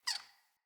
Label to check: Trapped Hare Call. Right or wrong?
Right